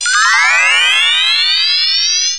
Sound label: magic sound